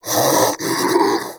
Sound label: Armadillo Vocal Breathe; Warframe; Characters; Hunting Critters